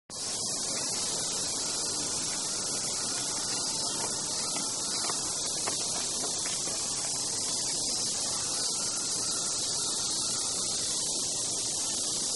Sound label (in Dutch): parkgeluiden